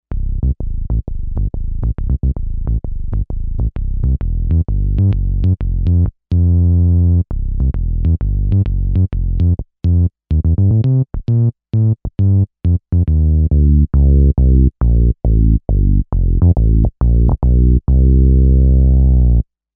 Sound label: Klik Bass, Kawai K5000S